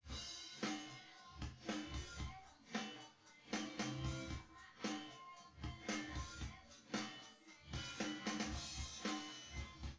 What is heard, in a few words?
wdrums synthconv